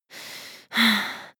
breath female intense

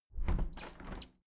window plastic squeak close regular sequence

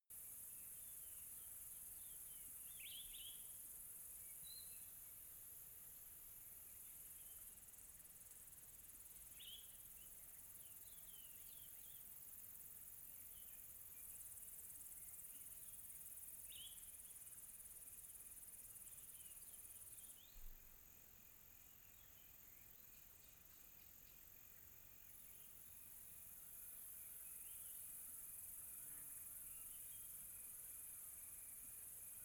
quiet tropical night with cricket

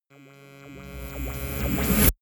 rise buzz deep

